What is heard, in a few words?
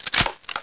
g3sg1 clip in, Weapons